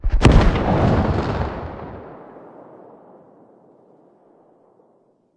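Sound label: grenade explosion far; Escape from Tarkov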